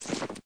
Grab Rod; Learn to Fly 2

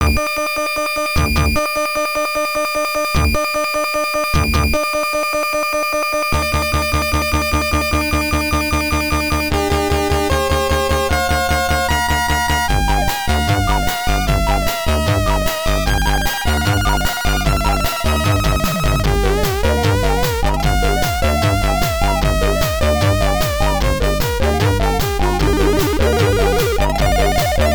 SID Stereo; Sly Spy Secret Agent Commodore 64; Stage 1 Sky Diving

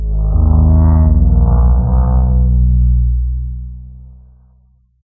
Bass Part; And Yet It Moves

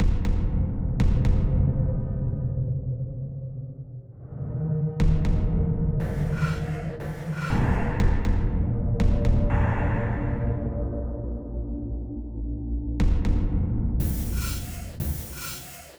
Danger Tonal; Insanely Twisted Shadow Planet

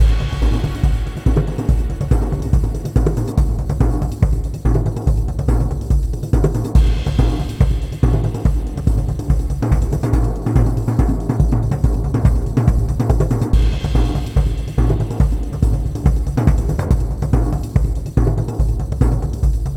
drum test; Unravel Two

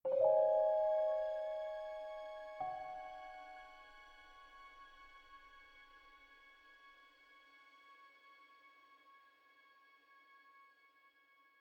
Suspense Short; Pillars of Eternity

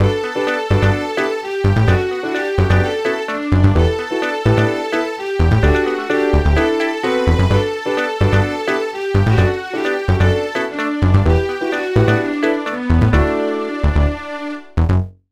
Disco

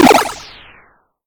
Appear Bunshin; Pac-Man Championship Edition 2 Plus

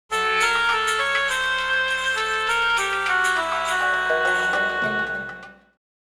Exploration; heroes of might and magic ii 2013

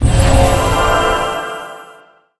sfx player ability unlocked

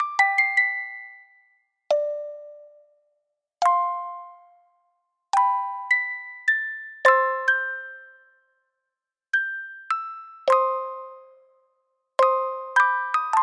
Soulful (Music Box); animal crossing new leaf welcome aamiibo soundtrack

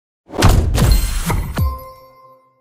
Tank Level Up

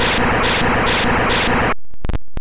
Fire Punch; sfx; attack moves; pokemon; gen 2; gsc

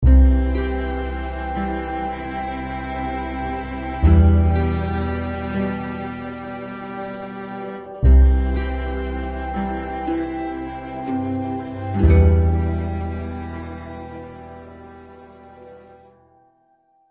Truck Stop; the walking dead season one; Wildcard

